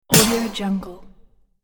weapon hit action game attack

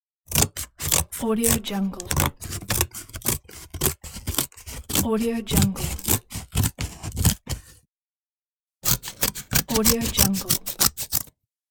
cardboard cutting

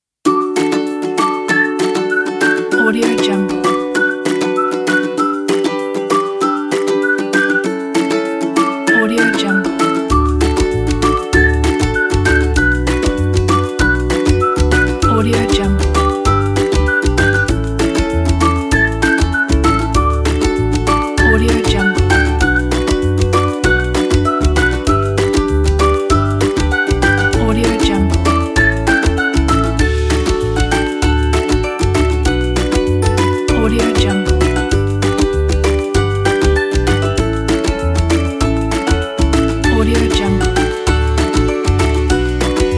kids game

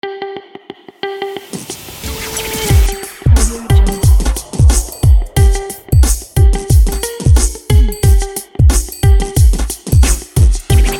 hip hop intro jingle

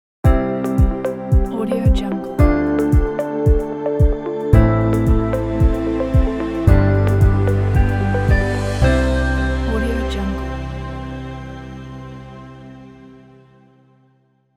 learning logo

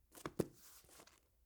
scrapbook grabs and handling

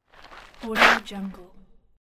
stopping on gravel path

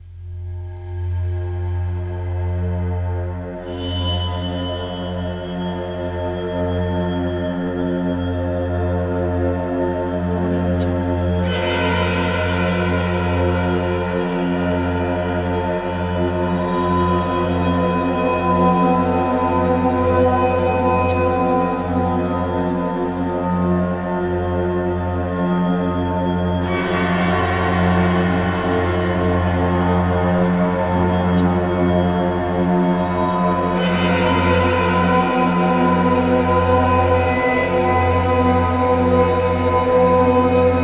disturbing horror strings ambience

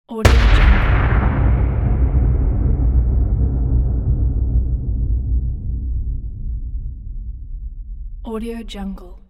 big impact

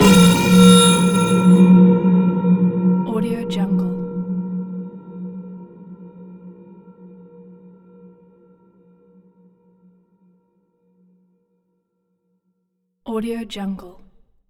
high pitched hit scream stab; sinematic 2; soundmorph

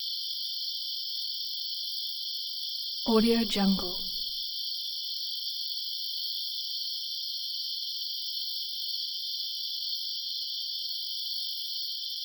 crickets daytime heavily filtered

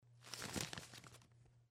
recycle scratch

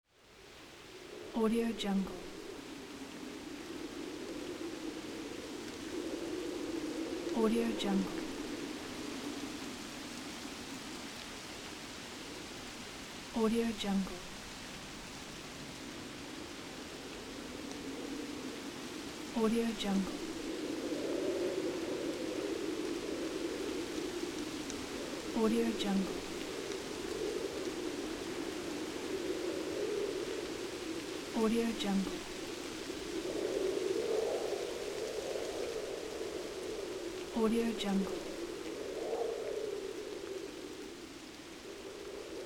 light wind and rustling leaves

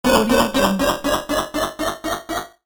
crushed game impact ascent

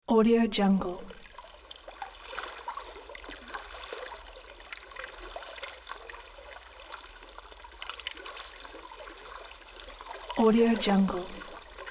mountain lake

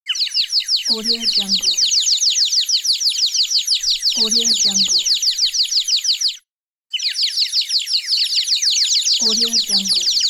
cartoon birds tweeting

